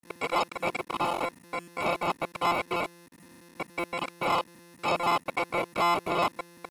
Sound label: chimes make a call; spectral